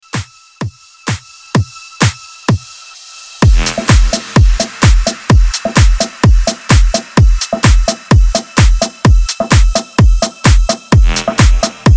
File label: Mashup 128 BPM